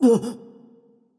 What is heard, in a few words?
surprise; smite; voicepack; hou yi